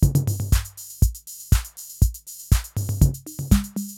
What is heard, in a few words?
CR-8000 LOOP, DRUM LOOPS